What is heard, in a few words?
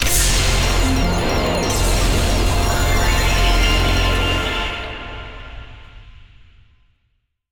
big Win Attention; spacewars